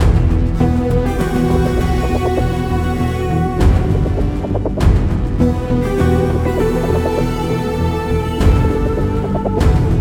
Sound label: Success Theme; The Eternal Cylinder ps4 ps5 windows xbox one xbox series xs gamerip 2021